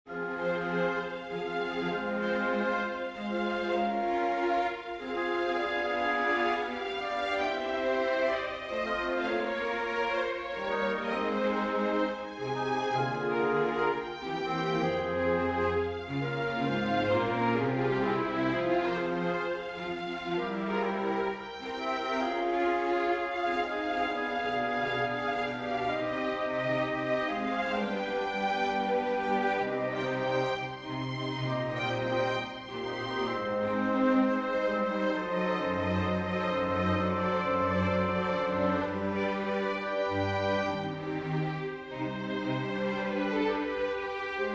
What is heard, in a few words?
for winds, strings; Surely hath borne our griefs